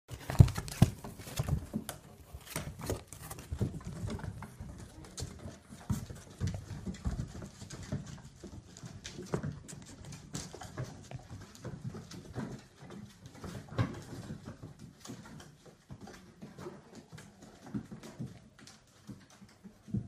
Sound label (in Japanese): hakuto itachi ashioto